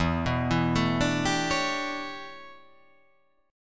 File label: E Minor 13th chord